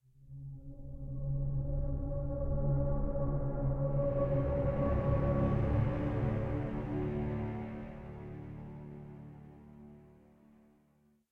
Music Ending; One Shot

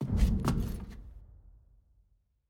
Cable Enter; Puzzle

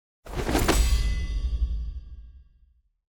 Cutscene Intro; bloom minigame

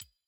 UI Hover; Dialogue; bloom minigame

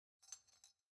Foley Ponytail

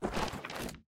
generic motion comic new page

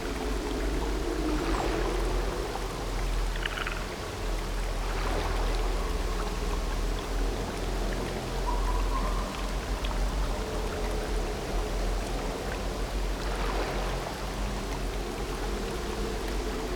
Amb; bloom minigame

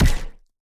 Enemy Wall Bounce; bloom minigame

